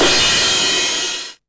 909 CYM Tape Sat; Goldbaby Tape808 & Tape909; The Tape 909

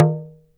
TALK DRM, Heart of Africa; Spectrasonics

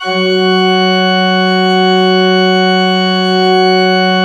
F2, Cathedral Organ; ILIO; Propeller Islands Cathedral Organ

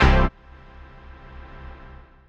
HOUSE, House Dance

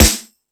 Sluggo Drums, Drum Machines

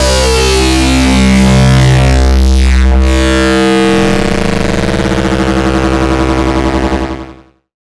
Synth Sounds; neuro; Stanza

